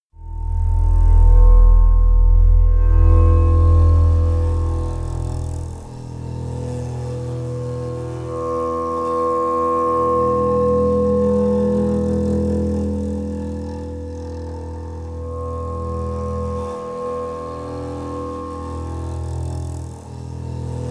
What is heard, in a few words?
broken cello